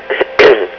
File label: CLIC HINK